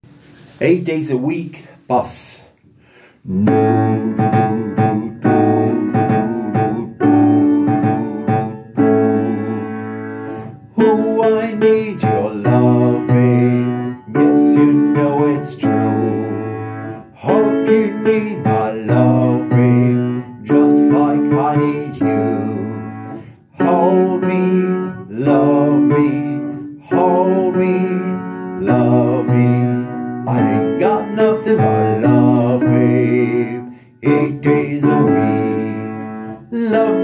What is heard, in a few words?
Eight days Bass